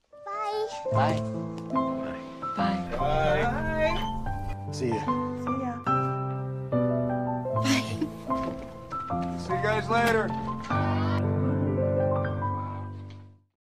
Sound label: bye bye music